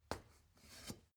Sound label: step ladder wood single